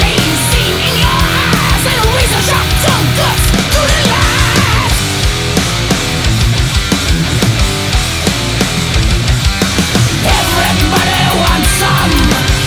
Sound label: distortion